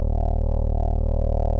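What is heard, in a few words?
force Mod loop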